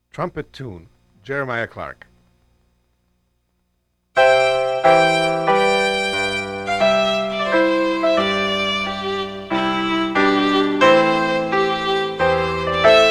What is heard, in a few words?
trumpet tune; j clark